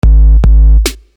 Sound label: Beat, Hip-Hop Street Beats